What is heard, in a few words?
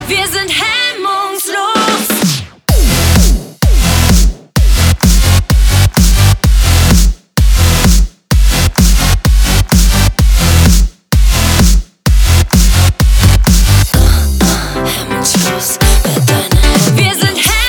House